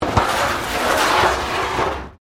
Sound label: METAL BIN SCRAPE CONCRETE; Sound Effects:Materials